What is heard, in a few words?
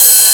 Hats Electro Open